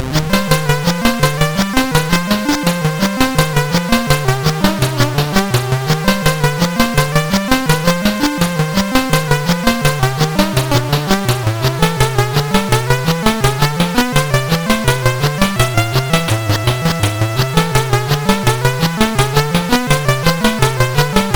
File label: Solomons Key, MOS6581R4